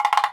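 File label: WOODBLOCK; ILIO; Synclavier Percussion 2; World & Orchestral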